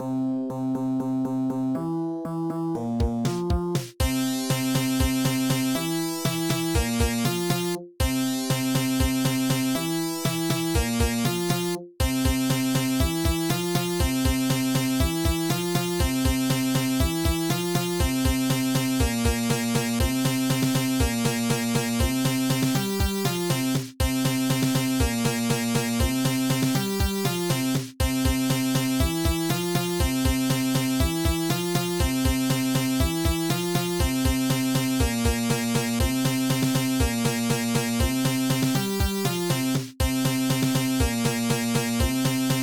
sega-style fm synth remix; dinosaur spirit guide; Fakebit / Chiptune Music Pack

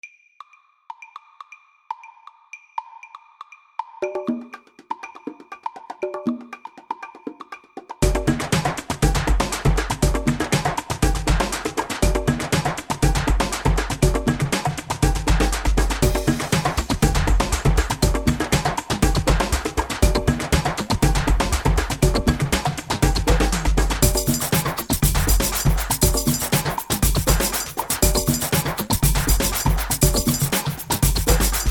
exotic drums

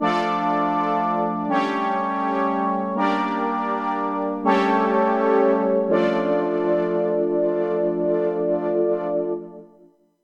synthesizer

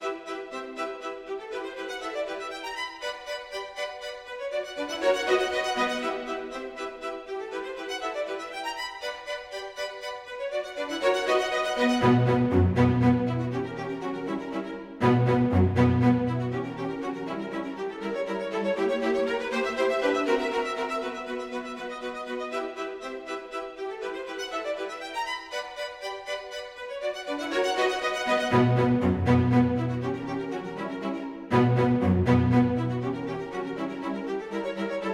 arranged for strings

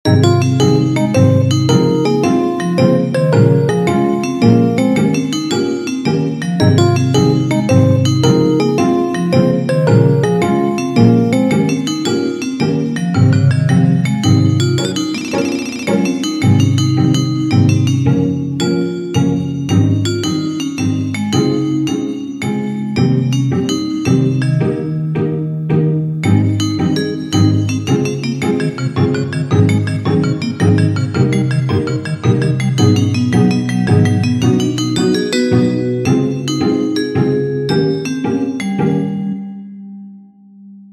Pop Music

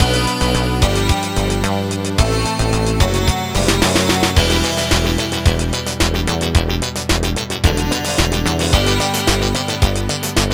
basic platformer music; world 2

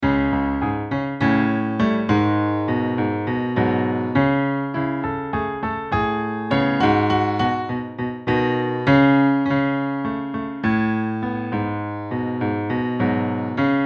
ominous groove - piano

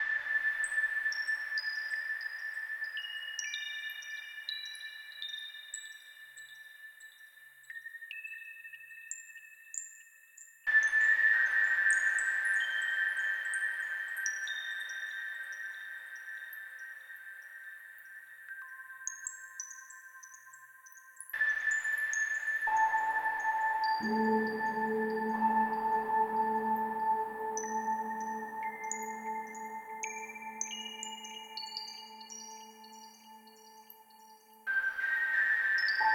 frog cave empty

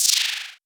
monster

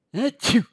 sneeze

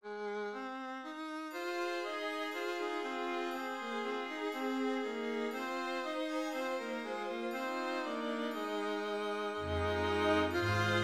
Oriental

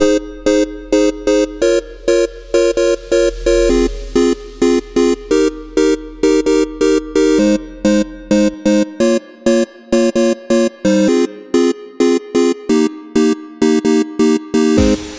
Free jump, 8-bit; 8-bit Pack 2

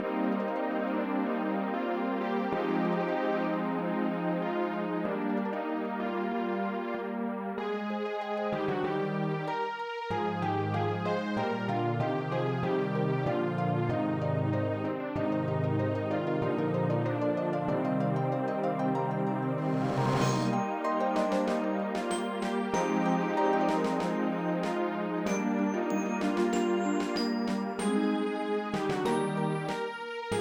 Distraught Princess

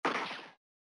gunshot

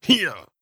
effort grunt male

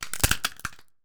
multiple cracks

